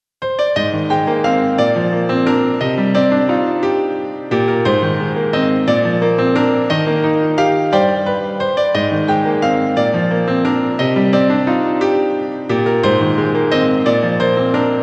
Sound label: piano